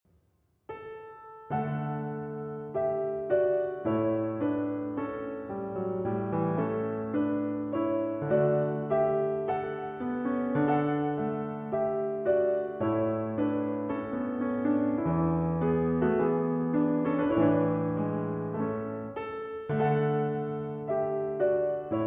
String Quartet, Solo Piano, Andante and Variations; Op. 18, No. 5; in A Major